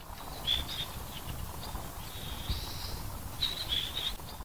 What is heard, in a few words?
birds; Adventures in Lestoria; action RPG game; Sig Productions